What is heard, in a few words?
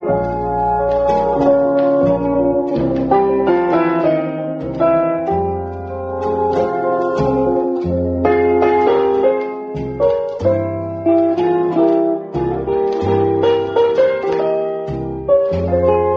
piano, sad